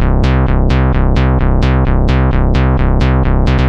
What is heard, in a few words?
Roller Bass